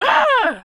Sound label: pain